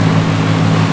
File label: cruser, Enemy SE; Nintendo; sf64